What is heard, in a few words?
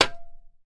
industrial tools shovel metal hit concrete floor; sound effects one